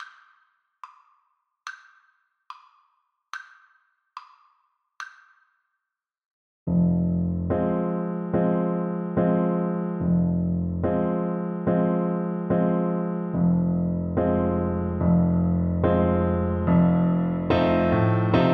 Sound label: bella ciao, Trombone